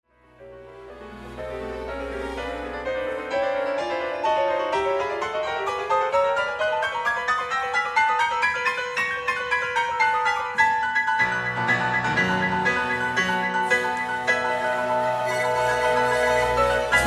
Concierto para piano; no. 2 Frédéric Chopin; gala chopin

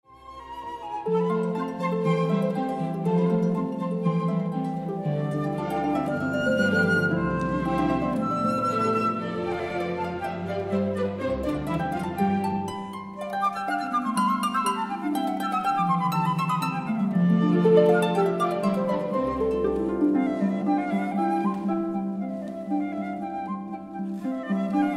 Concierto para arpa y flauta; Mozart

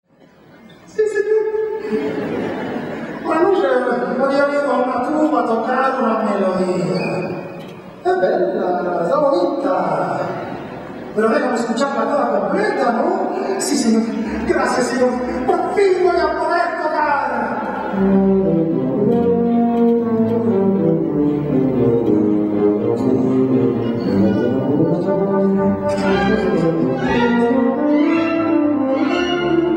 Tubi la Tuba

